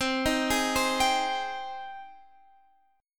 G sharp Major 7th C

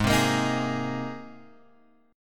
G sharp Major Add 9th, chord; G#add9 Chord {4 3 X 3 4 4} strummed

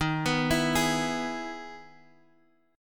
E-Major D sharp

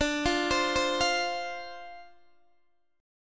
D-Minor 7th D Guitar DADGAD, chord

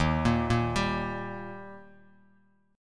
Eb Major Eb down Bass Standard; arpeggiated; JGuitar

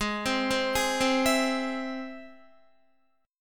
Ab Augmented Ab Guitar; 15,15,12,13,12,12; Ab+ arpeggiated

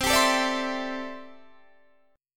C 6th Add 9th C down Guitar DADGAD

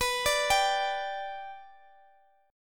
B-Minor Sharp 5th, Guitar; arpeggiated; JGuitar

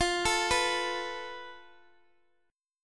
F Major Flat 5th Guitar DADGAD; down; chord shape

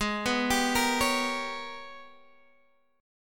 Ab Minor Major 11th Ab, Guitar Standard; arpeggiated; JGuitar

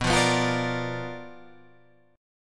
B Minor Major 7th Flat 5th, chord

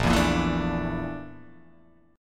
Bb Major 7th Flat 5th Bb; BbM7b5 strummed; JGuitar

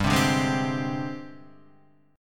G sharp Major 7th Flat 5th

